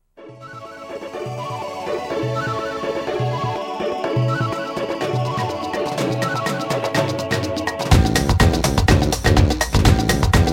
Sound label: congrats music